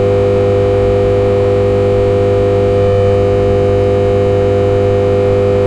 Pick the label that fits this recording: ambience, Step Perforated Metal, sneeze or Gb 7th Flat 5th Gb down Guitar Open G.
ambience